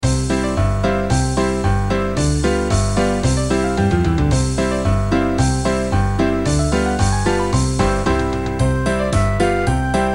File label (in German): zirkuslieder zirkuskinder playback